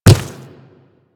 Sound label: Quadruped Medium Walk Dirt, Footsteps; Warframe; Infested